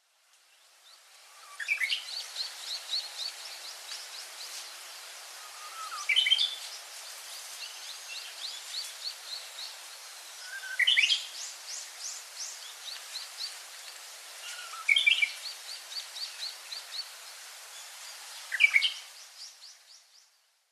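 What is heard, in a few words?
chosenuguisu, Korean Bush Warbler; yonaguni; koe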